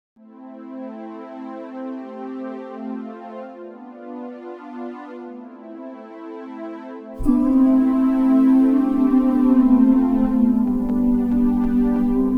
moogy oohs and beatz; weeklybeats 2024

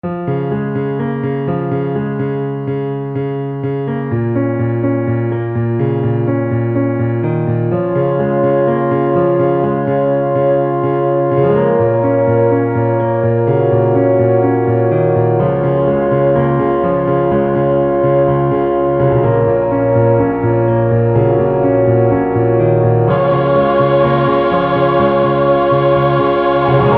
theme piano; in session saving private brian; weeklybeats 2012

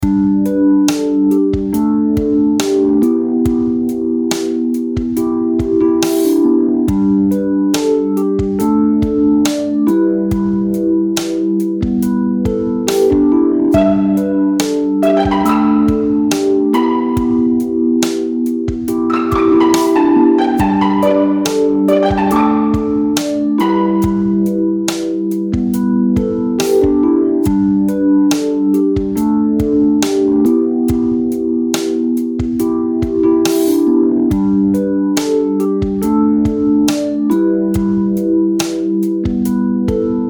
harpsicord

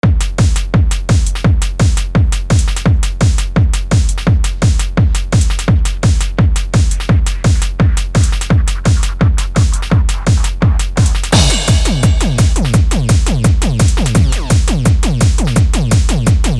stab

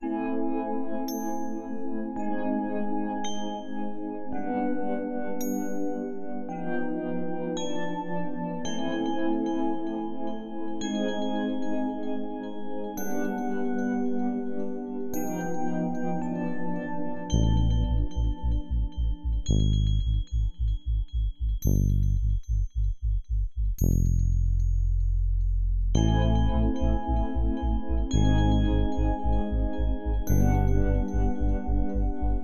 spooky chill; weeklybeats-2014

